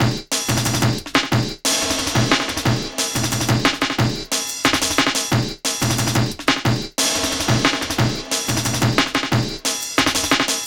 Killer Beat, Drum & Bass, Beats & Breaks; Fluid Dynamics

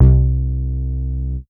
C3, BASS

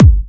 Trance Kicks, Bassdrums

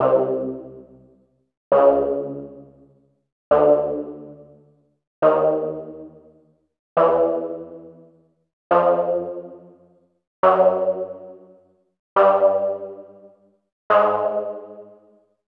Lead; KG MegaSaur; M Lead2

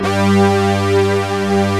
PERC 5ths

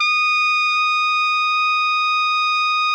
alto FF D#5, saxophones; Greytsounds; Philip Wolfe David Crozier's Saxophones

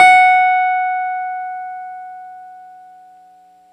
CLAVINET F#5; Big Fish Audio - First Call Vintage Keyboards

